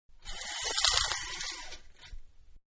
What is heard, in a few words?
Blinds Up, Venetian Blinds; Windows; Household; Manhattan Production Music